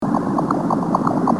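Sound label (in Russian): garshnep golos, jack snipe voice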